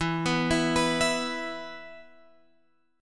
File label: E 5th, Guitar, Drop D